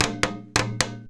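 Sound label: junjunba groove; Best Service; World Colours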